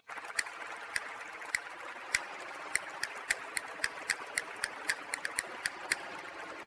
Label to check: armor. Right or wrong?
Wrong